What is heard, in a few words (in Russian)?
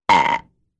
Отрыжка подростка